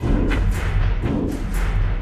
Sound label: Elektro Cayumba, Drums; Sonic Foundry (Sony Creative Software); Friendly Fire; Marc Steve